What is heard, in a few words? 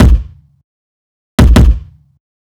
Kick, Kick Loops; KJ Sawka - Drum n Bass & Beyond; Drum Tool Box